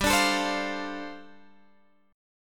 G 7th Suspended 2nd Suspended 4th, G, Guitar, Open G; x,12,12,14,13,15; down